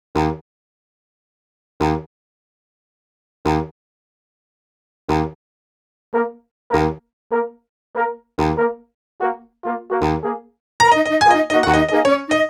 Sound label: Horns Piano Combo; Sonic Foundry (Sony Creative Software); Cinematic Funk Urban Tone Poems; Session 05 - The Triad